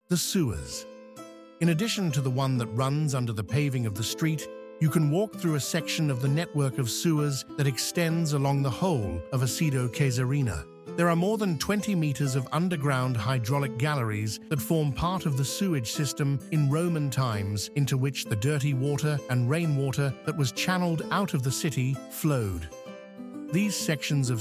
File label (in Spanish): música; archaeological museum audio guide